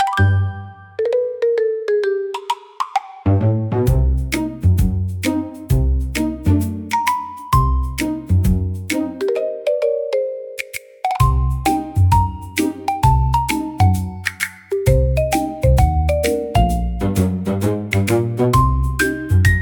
Marimba Mischief, comical marimba for funny scenes